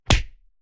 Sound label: Fight Impact; SoundsCrate